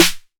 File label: 808 SD Tape Sat, Snare Drum; Goldbaby Tape808 & Tape909